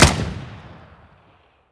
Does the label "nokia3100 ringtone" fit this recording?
No